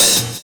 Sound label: FILL LOOP; Univers Sons